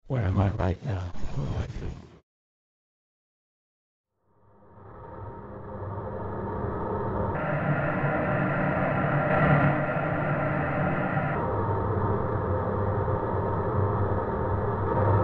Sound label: More White Noise; was built with the 2021 recording ‘White Noise’ and ‘Smell Things’ from the 2014 ‘Now for some Marlon’ soundpool